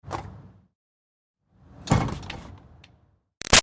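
Door Metal Commercial Pull Exterior Light Open Close; Doors; Big Room Sound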